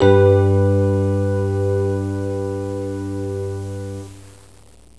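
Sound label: piano rhodes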